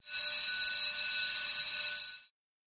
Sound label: bell; Wild Ape